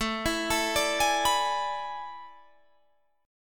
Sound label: A Major 7th Suspended 2nd Suspended 4th, Guitar, Drop D